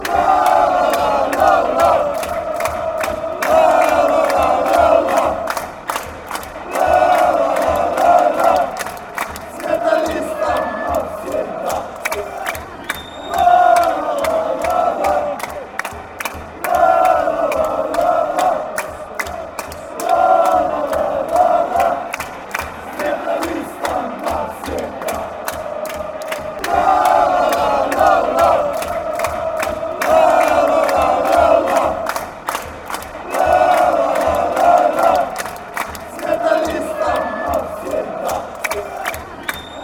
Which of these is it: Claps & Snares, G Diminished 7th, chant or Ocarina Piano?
chant